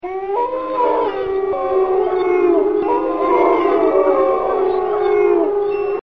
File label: wolf chorus